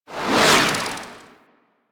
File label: Grenade Throw Whoosh; Enemies; Warframe; Infested; ZealoidPrelate